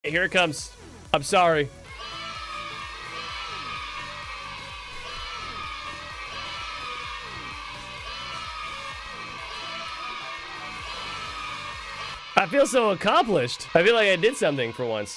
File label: children cheering